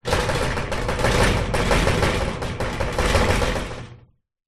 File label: industrial metal cabinet door impact shake rattle; zapsplat